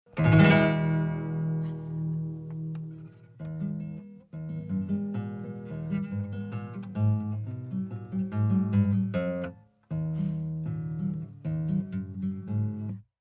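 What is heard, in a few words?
Acoustic Guitar Practic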